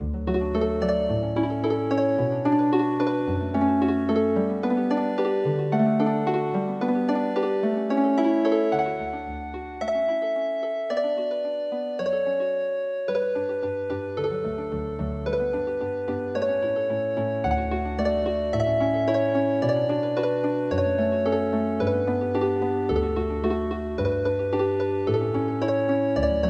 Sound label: Canon in D harp